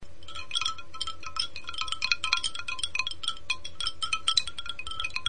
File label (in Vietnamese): Tieng chuong gio bang go tre